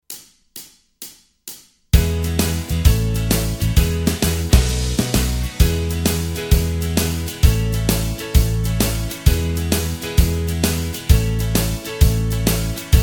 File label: (E) No Lead Guitar